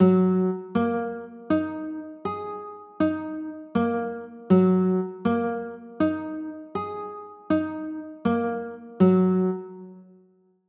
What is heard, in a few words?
a flat 6; a flat 9